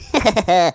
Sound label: Laugh; Redbull; Neighbours From Hell Console Edition; GC, Xbox